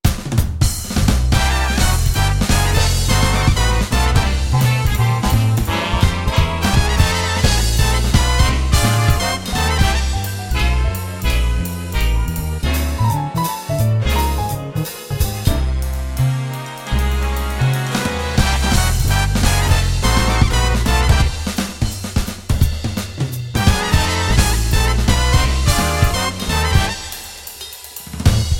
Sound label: cool big band